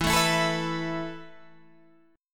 E Suspended 4th, Guitar, Standard; strummed; 12,12,14,14,12,12; JGuitar

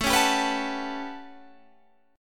A 13th, Guitar Standard, chord; strummed

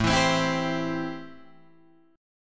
A-Minor 7th A Guitar Drop D

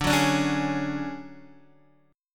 D Major Sharp 11th D Guitar DADGAD, chord

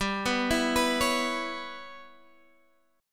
G 6th Flat 5th, Guitar, Half Step Down; G6b5

